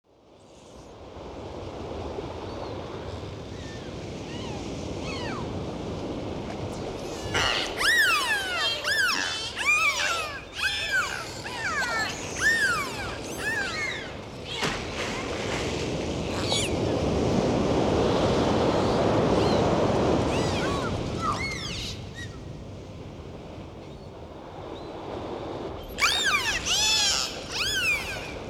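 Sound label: nature sounds, cheerful dolphins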